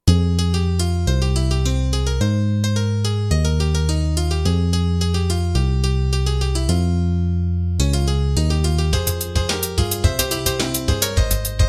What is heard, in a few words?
fairlight Korg M1